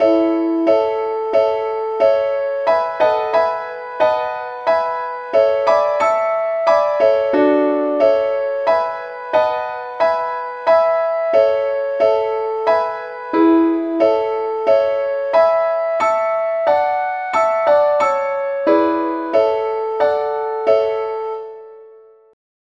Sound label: lead sheet, key A; SAINTTHOMAS